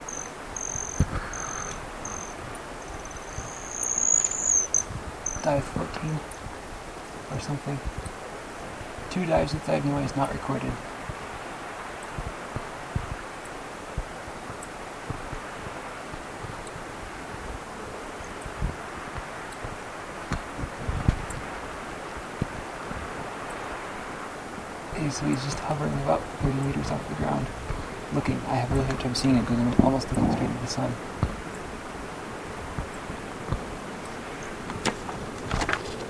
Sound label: Splatycercus